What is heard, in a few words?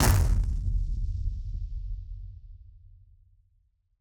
Hit Splosion; musicradar; bomb factory